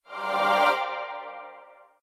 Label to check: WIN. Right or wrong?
Right